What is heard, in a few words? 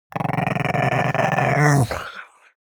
animal dog chihuahua growl strained; smartsoundfx